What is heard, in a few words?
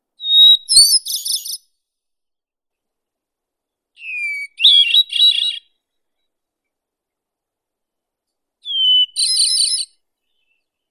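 hermit thrush, Catharus guttatus; drozd otshelnik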